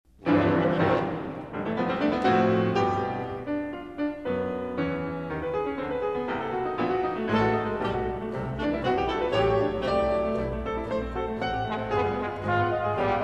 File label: For Wind Inst. Allegro; concertos